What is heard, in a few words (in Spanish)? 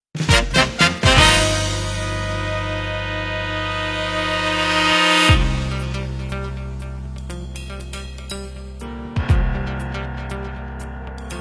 MUSICA PARA TELEVISION